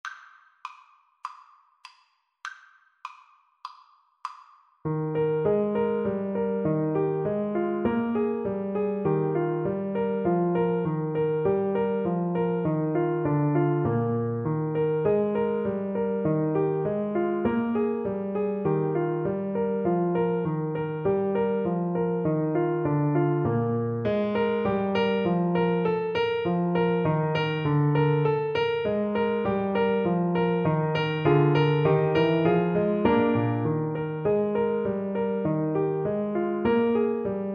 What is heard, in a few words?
trombone